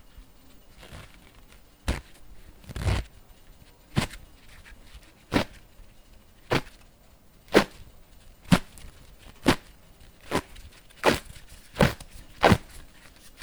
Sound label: bandage stretch